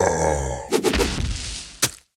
Ogryn Death

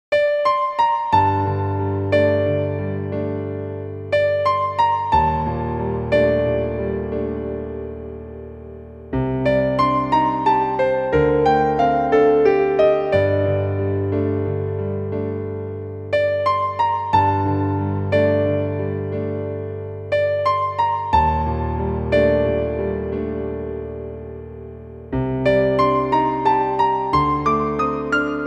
piano version; Nightmare Project YAKATA

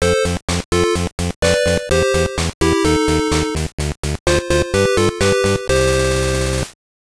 Jingle, gbc; Sylvanian Families Otogi no Kuni no Pendant

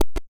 Chest; browserquest; android

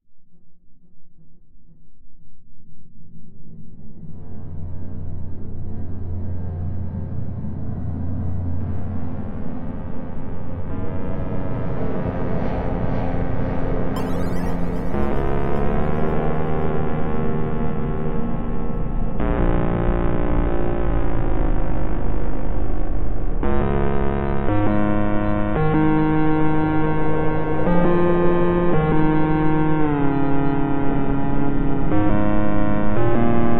Ambience - Paradise Broken; Just Shapes & Beats

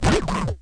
machine

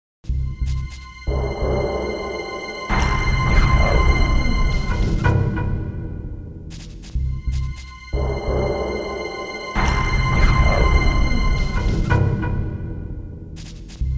Slig Barracks Tension; oddworld abe s exoddus

